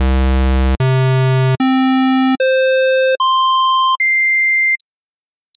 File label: Square, struck instrument simulator